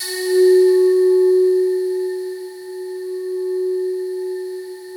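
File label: FOG ATTACK F#3 Left, Yamaha VP-1; Music Store; 90's Sample CDs; FOGATTACK 2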